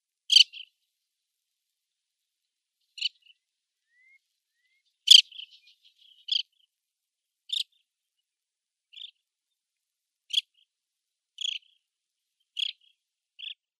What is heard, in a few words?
Lesser Sandplover call